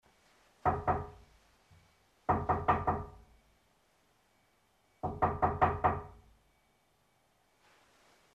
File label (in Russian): ostrozhno v mezhkomnatnuju